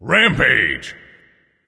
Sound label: Alert Notify Kill Streak; Smite